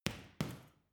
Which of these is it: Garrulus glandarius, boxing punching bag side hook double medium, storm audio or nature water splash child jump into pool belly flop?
boxing punching bag side hook double medium